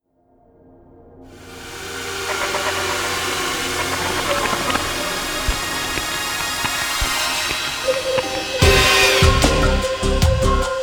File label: E Lounge, Industrial; Network Production Music; NM216 Hi-Tech Visions